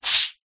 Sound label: paper towel wet squeeze squelch; foley; zapsplat